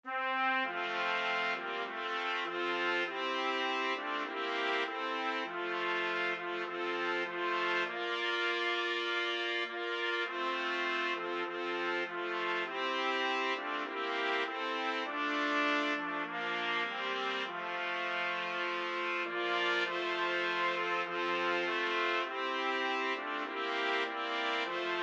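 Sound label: 4 trumpets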